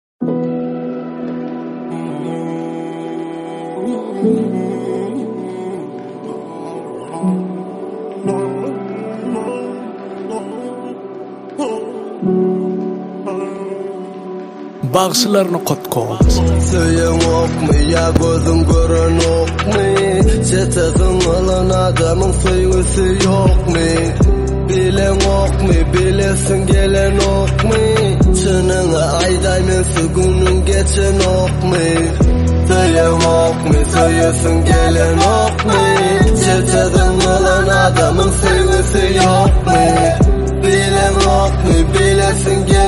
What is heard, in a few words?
rap